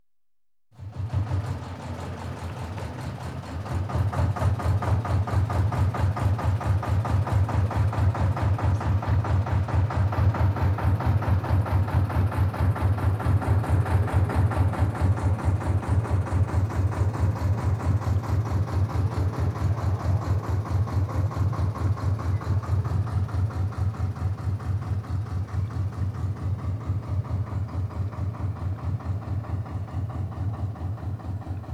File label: Canal Narrow-Boat, Departs; Ships And Boats; Reco; BBC Sound Effects Library; Ships And Boats 2